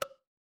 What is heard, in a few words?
cartoon bonk